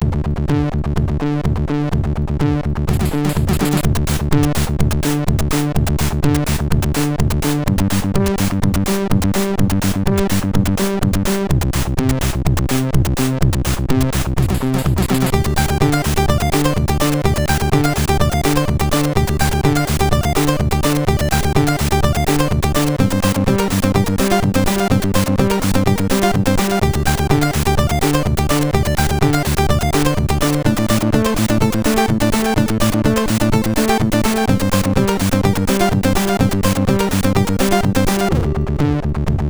Techno sid MOS6581R4

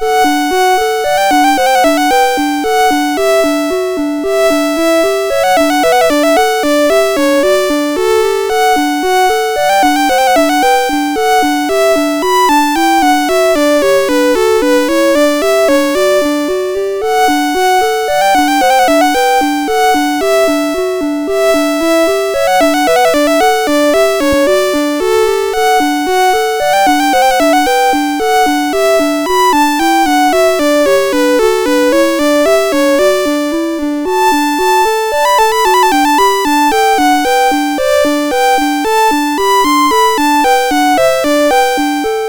Gavotte in D Major, MOS6581R4

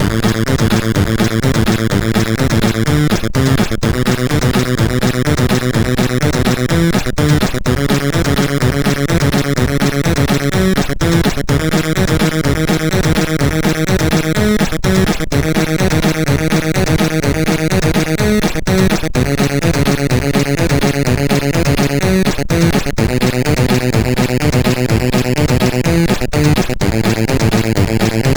sid CSG8580R5

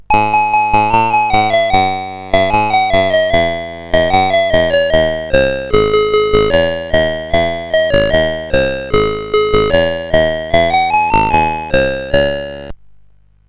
sid, CSG8580R5; Booga-Boo the Flea

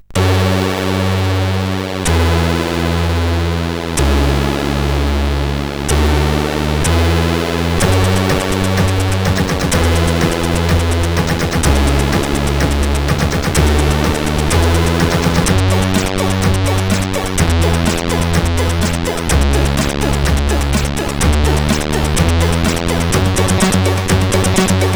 Best Intro, CSG8580R5

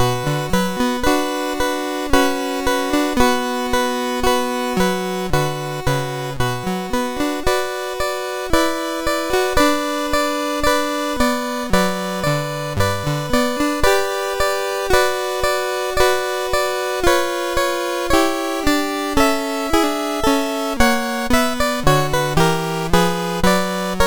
Prelude in B Minor, MOS6581R2; Op28 No6

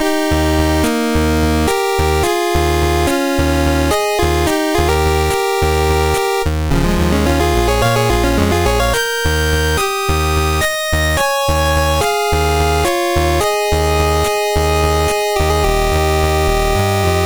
sid, MOS6581R3; Double Dribble

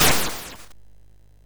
sid MOS6581R2; California Games

